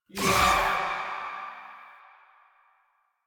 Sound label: Choir Erupt Proj Throw; Warframe